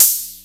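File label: percussion; ZERO-G; Datafile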